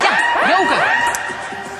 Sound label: alarm